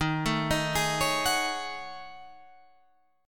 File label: Gb Minor 6th Add 9th Eb